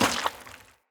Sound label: Heavy Grunt Boot Walk Water, Footsteps; Enemy; Sinecure